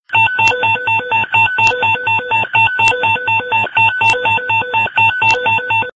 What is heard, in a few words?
Fire Dispatch Tones